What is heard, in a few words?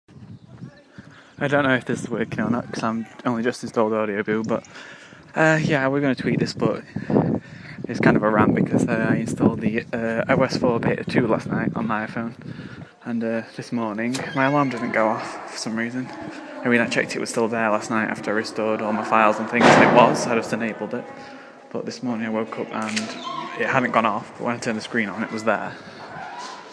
alarm failure